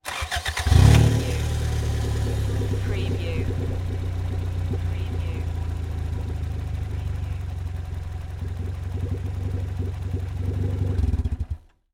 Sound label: Car Motor Start Up Idle Shut Off Engine Perspective